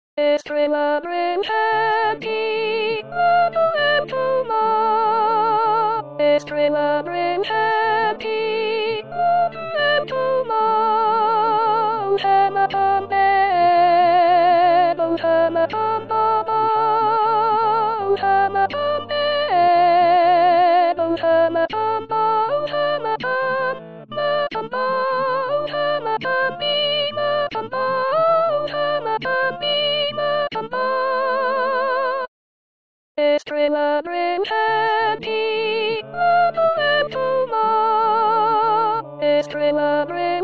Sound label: soprano